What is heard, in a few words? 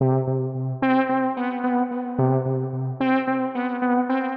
Synth 110BPM; sci-fi